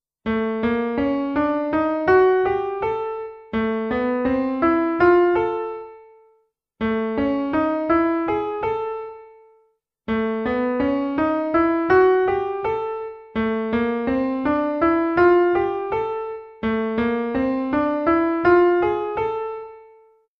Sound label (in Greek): Κλίμακες του κόσμου